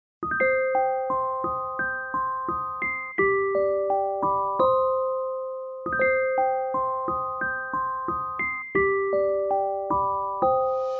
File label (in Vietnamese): Tieng hop nhac keu voi am thanh lang man